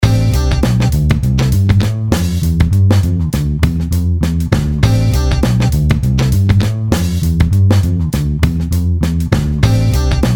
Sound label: Jazz beats